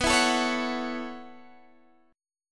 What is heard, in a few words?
B 9th Suspended 4th B down Guitar Drop D